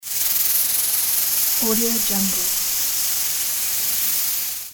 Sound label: cooker sound